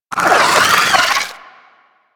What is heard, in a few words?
Amalgam Moa Satyr Vocal Attack; Warframe